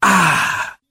"ah", Voice

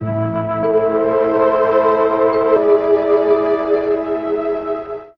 strings tragic, Sad Loops

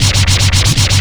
flash, Percussion, Vinyl

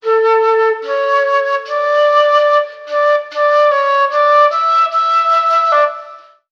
flute, Loops

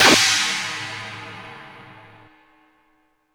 Cymbals

